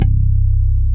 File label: Synth Bass, Roland